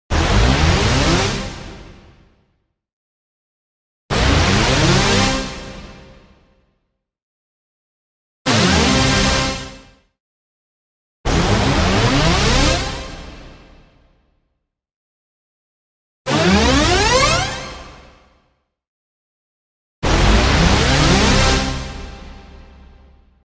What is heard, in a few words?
Big power-up synthesizer button; Music effect; Hollywood Edge Sound Designers Tool Kit